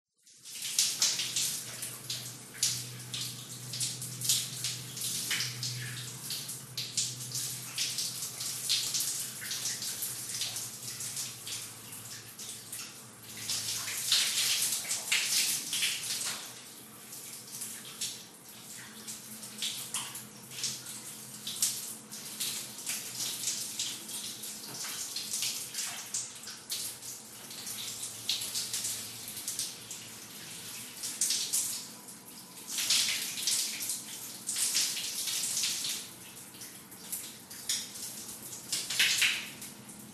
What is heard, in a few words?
household shower bathroom taking shower room pov traffic outside city